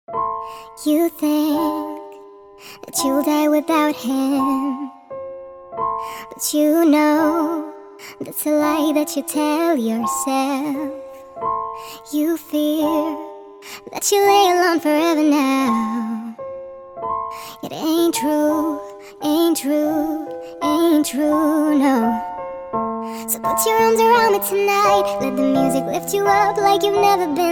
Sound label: Nightcore